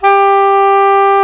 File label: SAX SOP MF G, Soprano Sh; Roland LCDP07 Super Sax